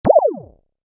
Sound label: Space fx pickup, Spaceship Environment Style Pickup, Short; Video Game; Games; Stuart Duffield